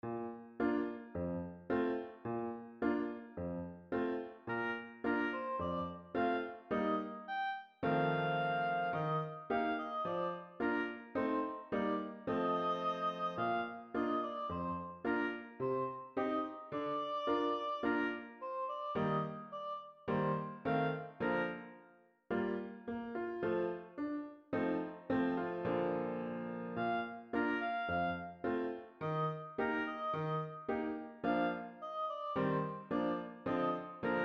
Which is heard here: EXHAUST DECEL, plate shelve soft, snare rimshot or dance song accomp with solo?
dance song accomp with solo